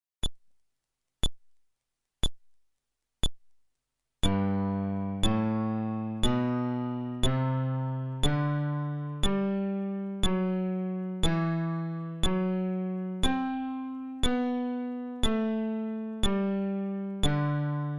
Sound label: Scale, fretless bass